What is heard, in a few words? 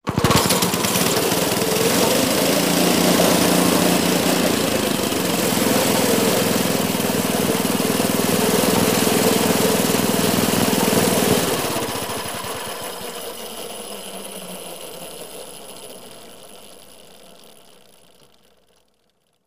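snowblower engine start idling stop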